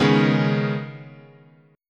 C sharp 7th Suspended 2nd, chord

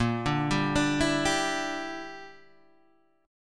A sharp 6th Flat 5th A sharp, Guitar DADGAD; down

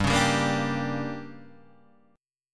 D Major 7th Suspended 4th Sharp 5th, chord

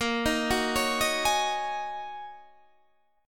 Ab 7th Suspended 2nd Suspended 4th, chord

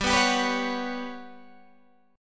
G Major 7th Flat 5th, Guitar Standard; 15,14,17,16,14,14; GM7b5 strummed; capo=2

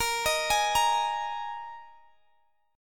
A sharp 7th Suspended 4th A sharp, Guitar, Drop D

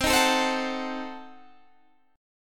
C Diminished 7th C down Guitar Standard; strummed; JGuitar

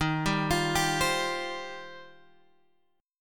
Eb 6th Add 9th, Guitar, Open G; arpeggiated; 13,12,15,12,13,x

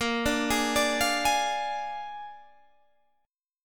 A sharp 7th A sharp, Guitar, Open G

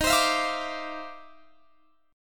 D-Minor 9th, Eb, Guitar, Standard; Dm9/Eb strummed

